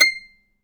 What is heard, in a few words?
Glittering Clink